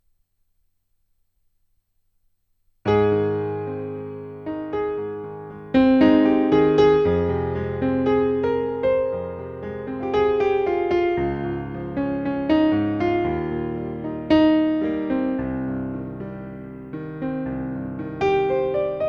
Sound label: DP7U Piano